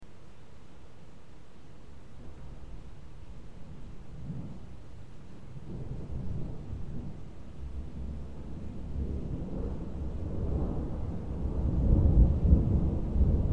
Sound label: Lazise Italy Lakeside Storm